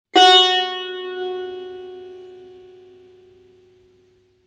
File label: tar, "sol" b